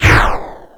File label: Fire Chomp Fire Ball